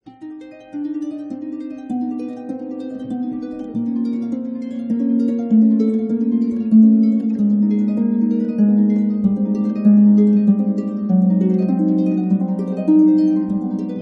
Prelude Valse Et Rigaudon

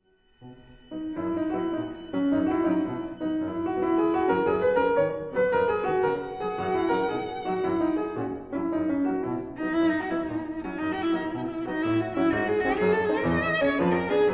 forlane alto piano

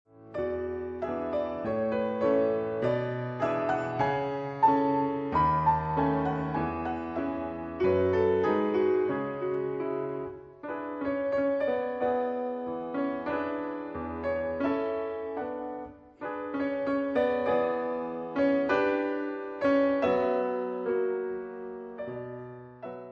berceuse, piano 4 mains

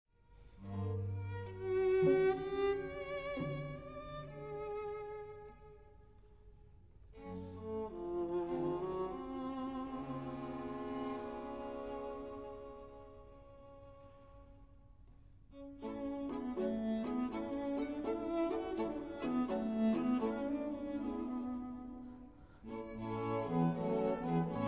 Quatuor la

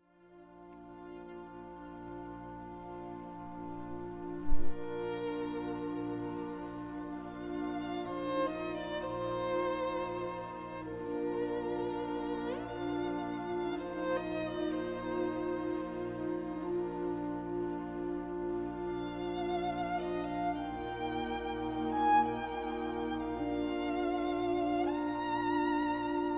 violon Chant d Amour; Concertante

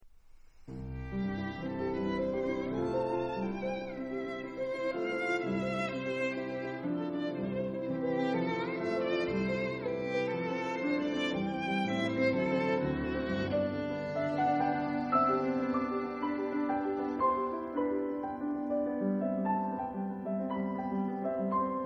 Sonate violon piano

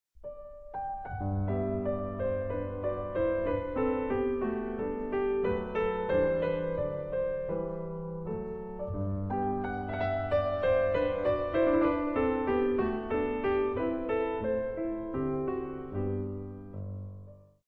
Sonatine, piano; Andantino rubato; ProPiano

